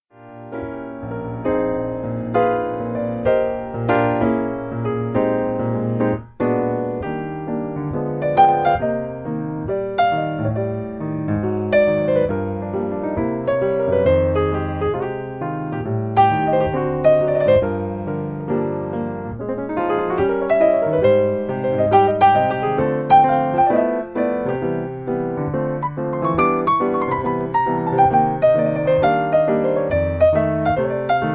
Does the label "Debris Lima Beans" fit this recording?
No